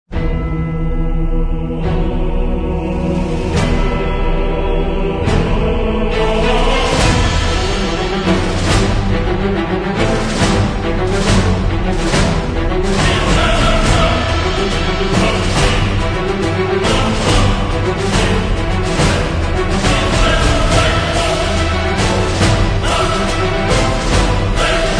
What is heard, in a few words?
HeroicSong